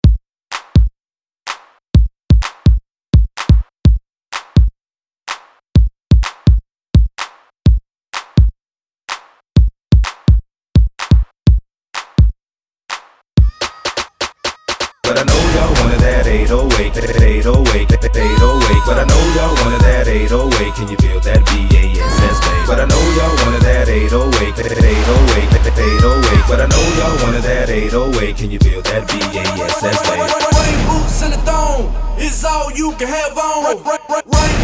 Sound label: bpm 126